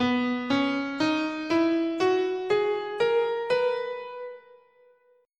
B Ionian, scale

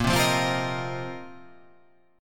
A sharp Suspended 2nd Suspended 4th down

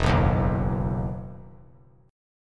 E Major 7th Suspended 2nd; EM7sus2 strummed

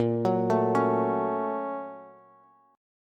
A sharp Minor Major 7th Sharp 5th, Bass

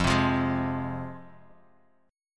F Minor, F; x,x,3,5,5,3,x,x

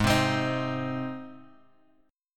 G sharp Major G sharp, chord; G# Chord {4 3 X 5 4 X} strummed

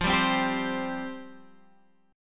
F Suspended 2nd F down Guitar Half Step Down

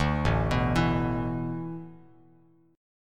A Suspended 2nd Suspended 4th, Bass Standard; Asus2sus4 arpeggiated

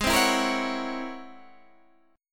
C sharp Minor Major 13th G sharp, guitar chord

